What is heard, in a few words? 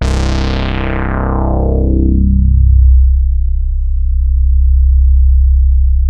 LEADSWEEP, BASS STATION; Syntec; Wall of Sounds